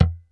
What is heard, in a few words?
E0 DEAD, STIK BASS; D-STIK BASS2